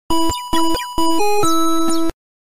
pokemon healing sound

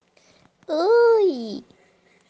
uiiiii

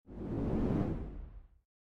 camera hand

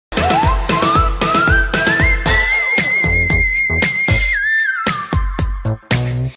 sneezes; ariana grande

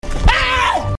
aaahh

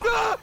gaah

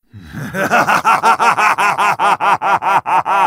infinite laugh

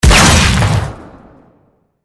punch; crazy diamond

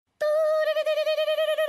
tururururu answers the phone; doppio; jojos bizarre adventure golden wind

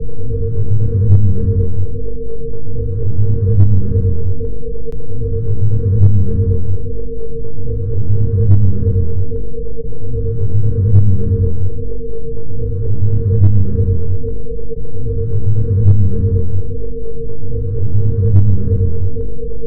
reactor ambient; among us

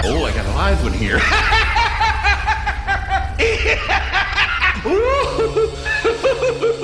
laugh; joker

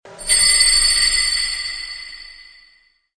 chime; jigoku

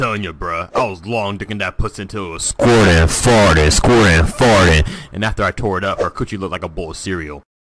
squirtin fartin

